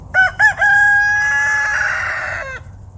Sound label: crow